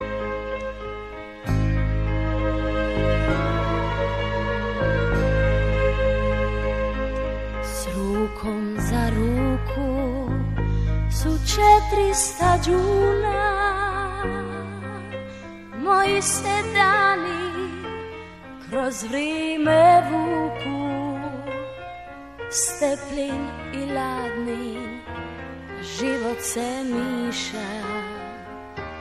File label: romantic croatia